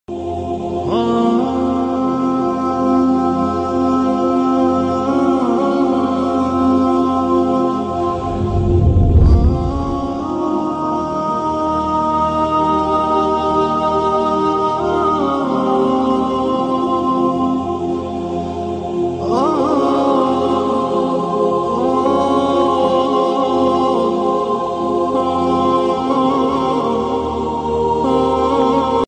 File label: sad islamic background music